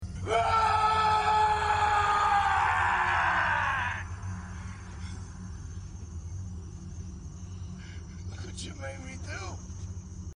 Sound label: aaaaaaaahhhhh; bray